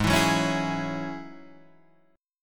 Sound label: G sharp 7th Suspended 4th Sharp 5th G sharp, Guitar Half Step Down; G#7sus4#5